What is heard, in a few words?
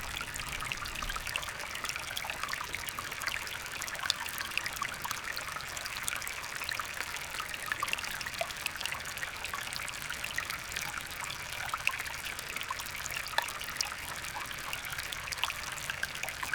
gentle soft trickle of, creek